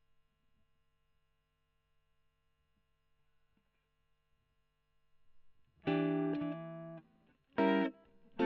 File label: E.GTR; Gefell